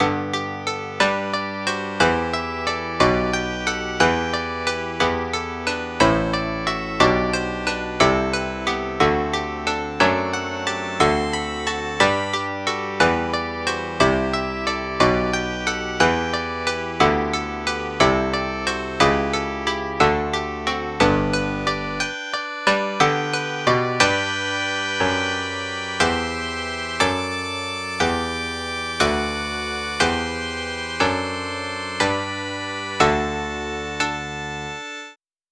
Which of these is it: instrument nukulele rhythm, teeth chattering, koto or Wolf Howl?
koto